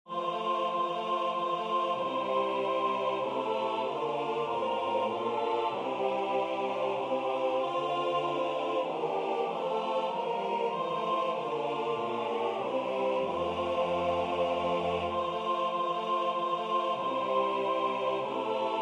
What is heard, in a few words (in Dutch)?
Houd mij in leven SATB; psalm 25 iii